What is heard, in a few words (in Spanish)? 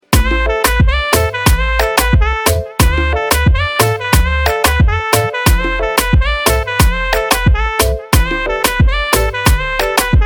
instrumental